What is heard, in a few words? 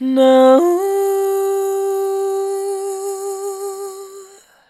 Naouuh, Vocals AdLib; Ghosthack